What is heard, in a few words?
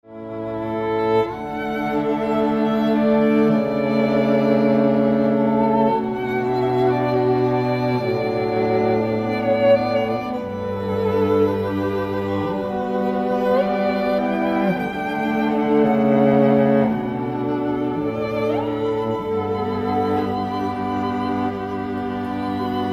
oboe